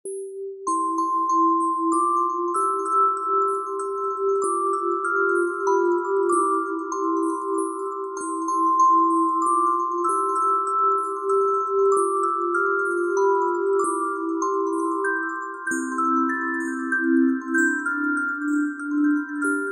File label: O Christmas Tree Dream Bell